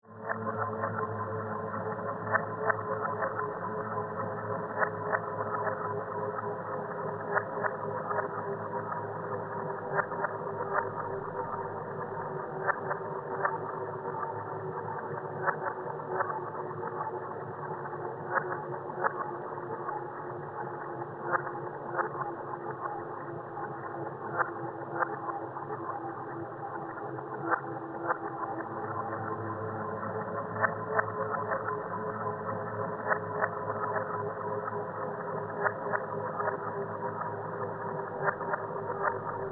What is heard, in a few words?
Ambience, Alien, Lair, Creepy, Suspense; Sci Fi; Sci-Fi (Sound Effects:Science Ficti